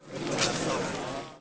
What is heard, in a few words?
market; 帝国时代+罗马复兴